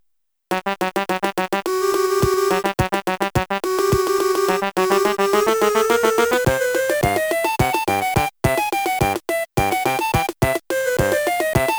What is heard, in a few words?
Polka; The Even More Incredible Machine macintosh macos gamerip 1993